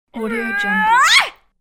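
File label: girl combat shout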